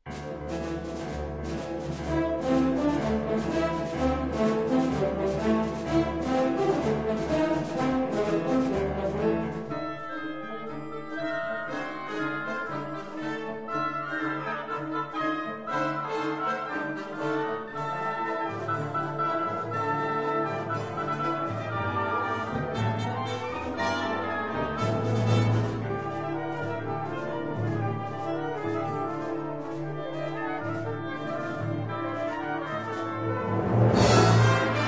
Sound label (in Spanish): Tambor